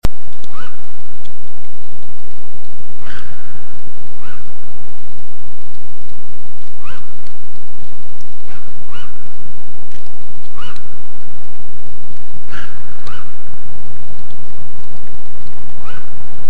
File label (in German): nachtreiher; Mag. Peter Holub